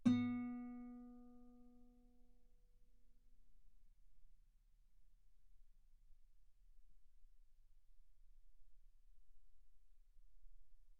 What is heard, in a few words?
Guitar ff sul B B3